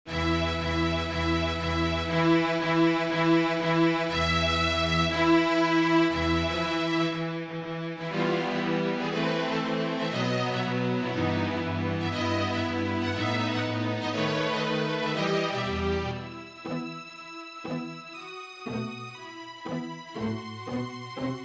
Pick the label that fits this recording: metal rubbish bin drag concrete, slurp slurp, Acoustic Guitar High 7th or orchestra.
orchestra